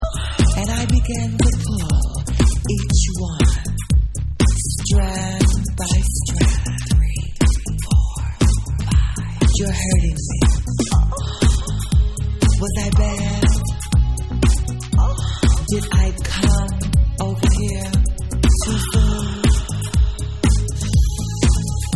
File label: Vocal Mix